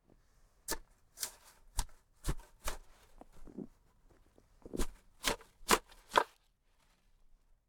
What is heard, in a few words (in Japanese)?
雪かきをする音(雪少な目)